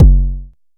analog tonal, Kickbank

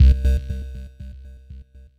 Kevlar Mystery, Analog Bass; Ableton; Retro Synths; Analog Bass Vol 2

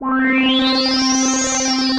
Synth Pad; Poli; Carl Cosmos

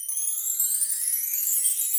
Chimes A Stick up, Latin Percussion; Drum Hits; Bell; Ableton; Factory Packs

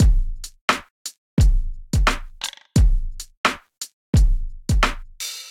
MPE Speaking Kit, drums; MPE Kits; Ableton; Factory Packs; Golden Era Hip-Hop Drums by Sound Oracle

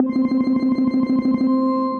Dark Ambience, Synth Rhythmic; Ableton; Glitch and Wash

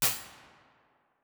Echoroom, IR samples; Effects; Convolution; Medium Rooms; NI Kontakt 5

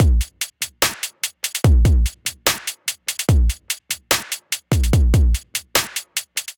Beat 146 bpm; MIDI Clips; Ableton; Factory Packs; Skitter and Step

